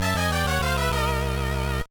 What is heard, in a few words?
failed; Klonoa 2 Dream Champ Tournament; gameboy advance